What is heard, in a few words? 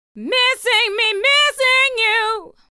voice girl